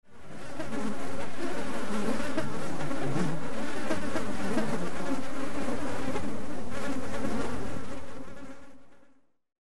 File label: Mosquito Swarm; horror sounds; Hollywood Edge